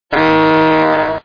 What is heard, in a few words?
honk